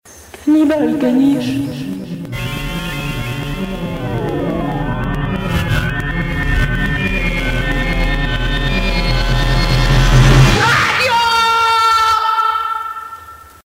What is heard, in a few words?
jingle; Larm